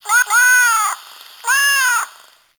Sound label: Cat Loot Crate Meow; Warframe